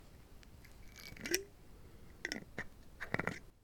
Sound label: Open Close Glass Jar